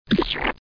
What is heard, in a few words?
Plop